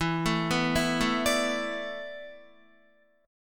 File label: E 7th Suspended 4th, Guitar; E7sus4; arpeggiated; 12,12,9,9,9,9